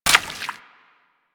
Steps Water, footsteps; Warframe; Grineer Royal Jester; Foley

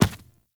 Medium Run Default, Footsteps; Warframe; Infested Footsteps Medium

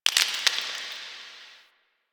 Stone Debris; Ambience; Warframe; The New War; Quests; Zariman

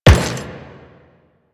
Step Metal, Footsteps; Warframe; The New War; Kahl; Quests

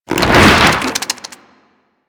Infested Devourer Movement Whoosh D; Warframe

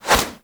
Punch Whoosh; Warframe; Weapons; Infested; Inf Kick Punch

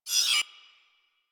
Hud Gear Slide In, UI; Warframe

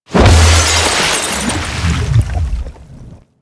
Pilot Breakout Hit Three; Warframe; Amalgam Carrus

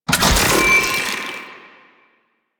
Sealed Cache Activate; Warframe; Zariman

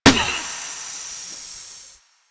Car Tire Pop; Warframe; Ambience; Nineteen Ninety Nine

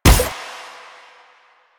Mech; Duviri; Incarnon Adapters; Incarnon Sicarus Prime; Weapons

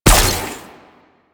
Weapon Fire; Enemies; Sentient Dolicholyst

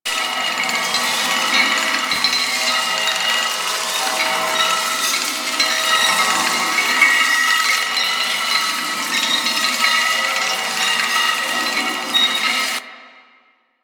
Metal Rotating Prop Loop; Ambience; Props; Warframe; Entrati

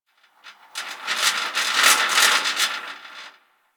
Rattle Metal; Warframe; Corpus Gas City; Ambience

